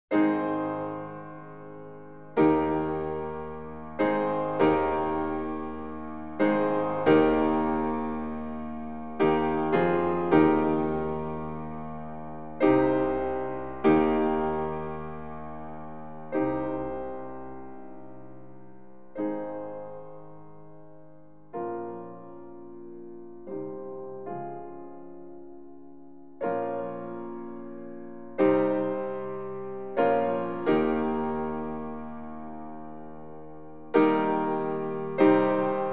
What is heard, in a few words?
Easter Hymn; Sacred Hymns